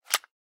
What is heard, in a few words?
unit move